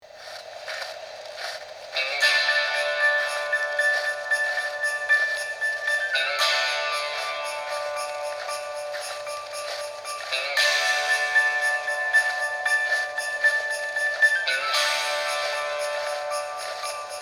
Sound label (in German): Nikolauslied mit Grußkarte